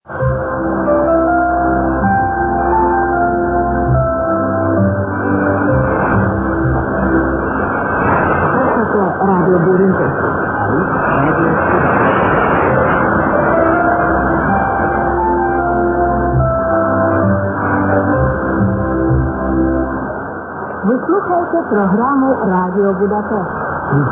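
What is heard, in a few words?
radio, 9535 kHz; R Budapest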